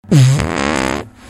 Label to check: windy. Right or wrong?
Right